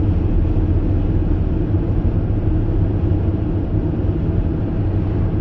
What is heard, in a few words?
Highway